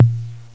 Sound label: Analog Tom, drums; GMPlay; XG